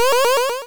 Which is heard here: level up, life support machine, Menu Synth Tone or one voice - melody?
level up